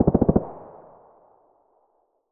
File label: hk416 burst long; weapons; SPT Battle Ambience